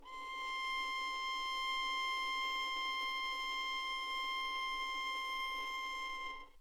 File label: violin